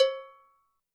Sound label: bell, Zoom MRT-3B; Kit10